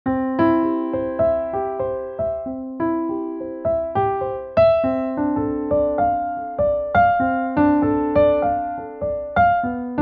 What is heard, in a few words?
Bach Swing